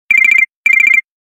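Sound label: standard sms message on Nokia; standartnoe sms soobschenie na nokia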